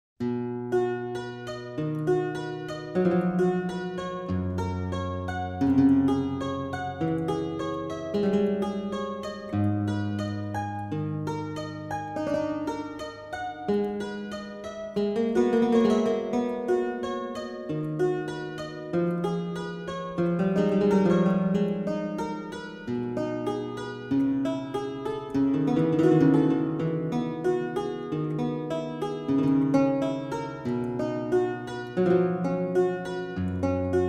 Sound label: German Lautenwerck, Praeludium; Johann Sebastian Bach